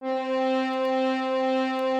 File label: Instrument